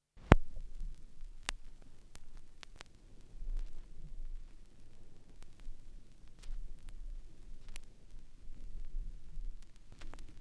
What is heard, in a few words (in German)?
schallplattengeraeusche